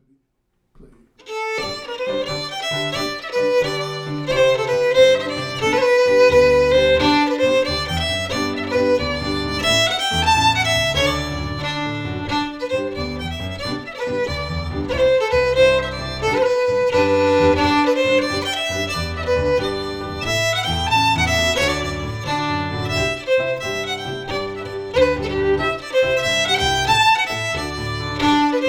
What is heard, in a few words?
FIDDLE PIANO; Jink & Diddle Book o' Tunes 2021